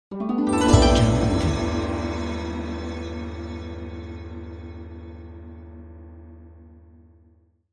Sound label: Asian Gong Ident